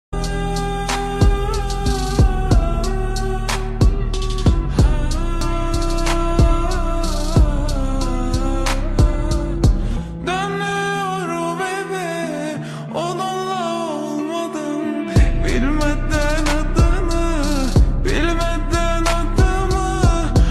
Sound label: slowed reverb